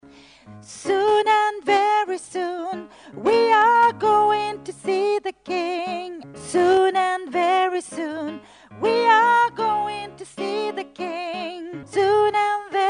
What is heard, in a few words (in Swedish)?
sopran